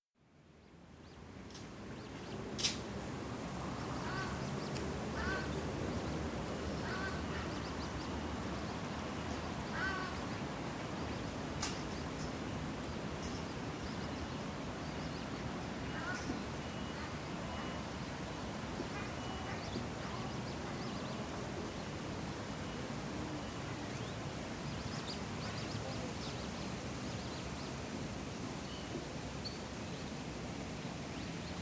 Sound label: City Park on a breezy day, near a pond with ducks, moorhens and geese; Nature; BBC